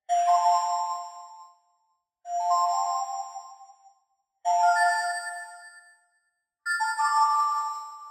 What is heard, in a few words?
Arcade Click Neutral; boom library; The Interface